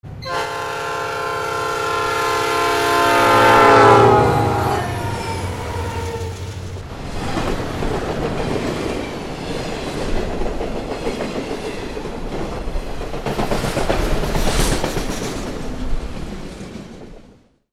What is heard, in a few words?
TRAINS